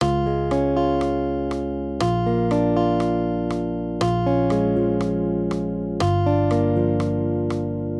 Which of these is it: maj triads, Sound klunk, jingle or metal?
maj triads